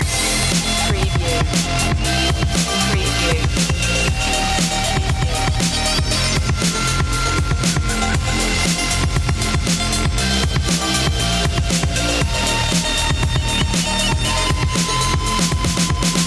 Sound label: oriental chaos loop